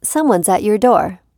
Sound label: Someone at Your Door, alert tones; Ring